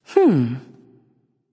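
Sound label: thinking, female; smite; voicepack; hera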